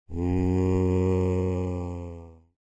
dark tree moan; snow white

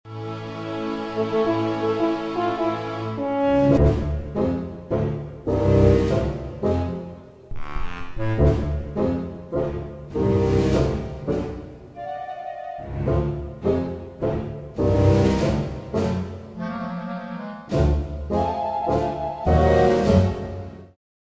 music loop

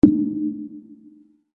button

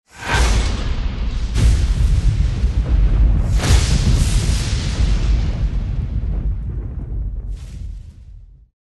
lightening; potc teaser2

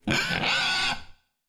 Squeal; Archie; monsters university

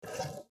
carousel slider; toystory

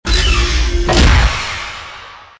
servo

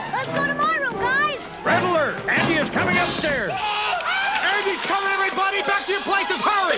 stairs; andy

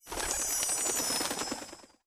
navbar close; princess

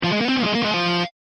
icon Roll; kickbuttowski; mellowbrook mayhem

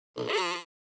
Squeal; Monsters University; Archie

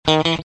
guitar mute

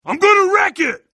wreck; ralph; fix it felix; wreck-it-ralph

